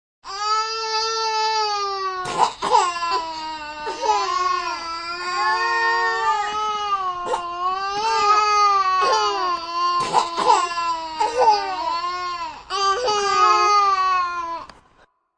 Two Babies Crying, two upset babies crying, multiple yells; High quality stereo sound effect of two upset babies crying, multiple yells, sobs and whines.